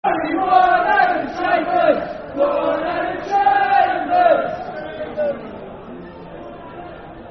Football Chant (Soccer Song); One Adam Chambers; Leyton Orient Lofc

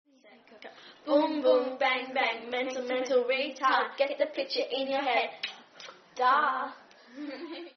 Clapping

